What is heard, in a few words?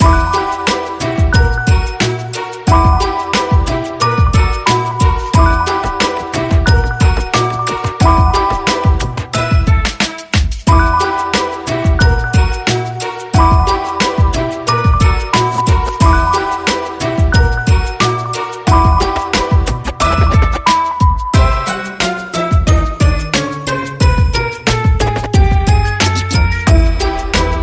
Hip Hop, Underscore